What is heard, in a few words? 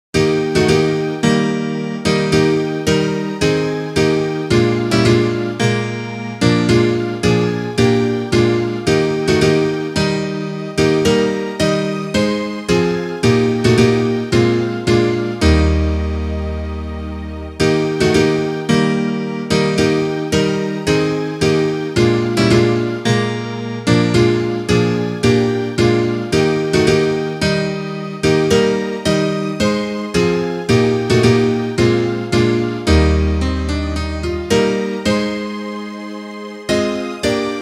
instrumental